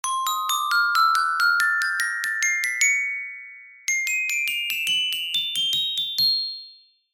cartoon climb ascent light footed mouse xylophone tip toe